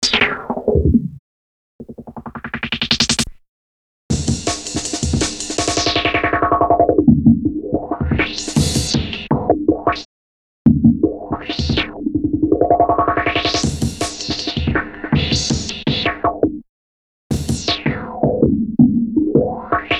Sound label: breaks, Jungle; Zero-G Jungle Frenzy 1